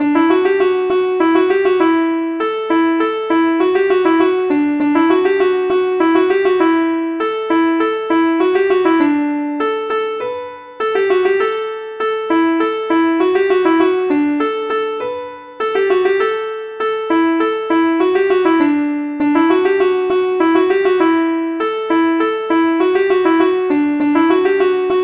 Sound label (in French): suite scottish